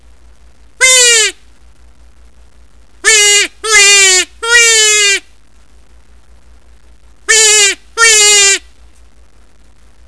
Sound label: Yote Smoker fawn, coyote